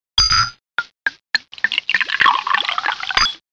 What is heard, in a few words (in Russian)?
nalivaut v bokal